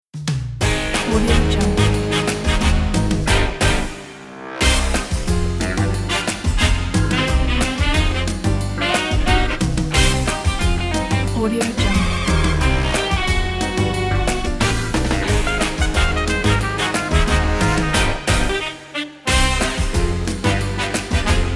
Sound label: mambo